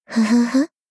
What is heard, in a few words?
laugh